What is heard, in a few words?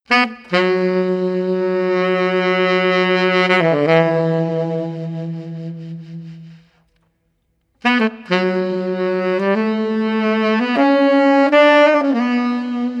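Street Tenor Sax Pensive, 80 bpm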